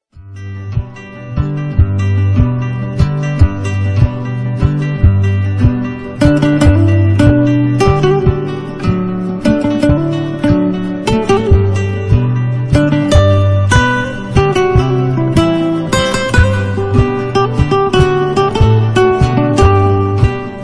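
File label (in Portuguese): Instrumental Violao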